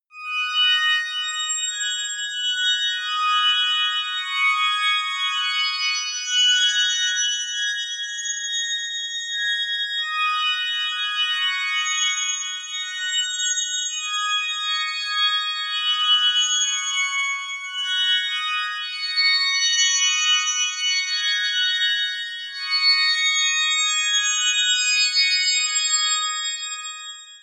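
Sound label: diffuse asian strings high, pads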